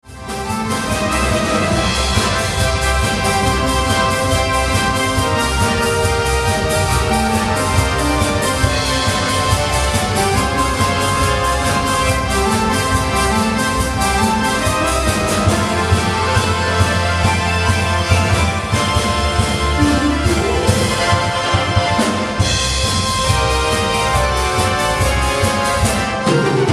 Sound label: Disco